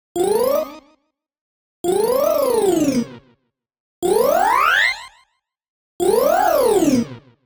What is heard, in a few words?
Arcade Click Neutral; boom library; The Interface